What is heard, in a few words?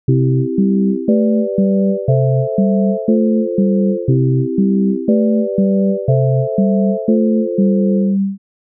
synth